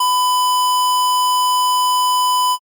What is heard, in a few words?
ecg flat